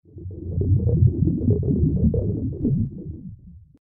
bubbling deep

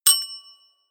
notification bell

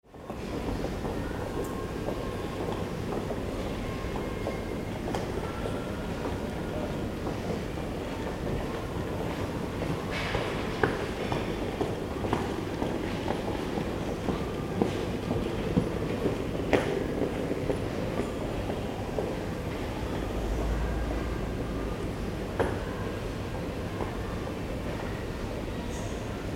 shopping mall pedestrians music

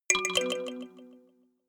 notification kalimba delay fourth up

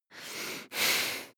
breath female heavy nose

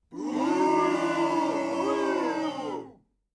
boo short

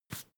broom hand sweep short

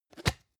plastic can small lid open hard slow

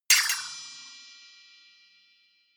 percussion

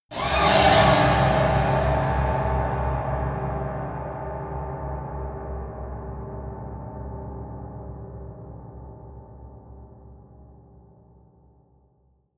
piano twang fast squeal long

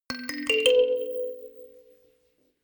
notification kalimba rattle sequence ascend

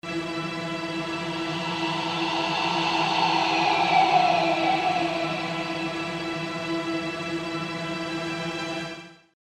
string tremolo movement

